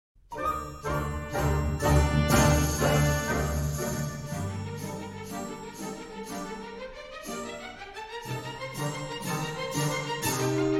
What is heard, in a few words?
Galop; Grand Allegro For 1 And 4 Settings